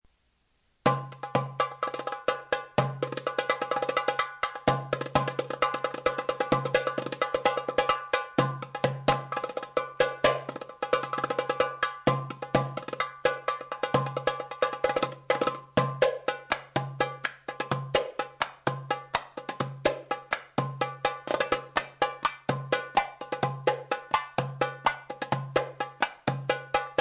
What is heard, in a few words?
tabla; Vince Plays some of his favorite drums